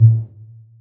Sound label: slot reel stop